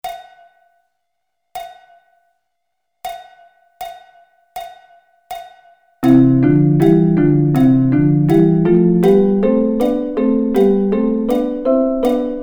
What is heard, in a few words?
C Double Stops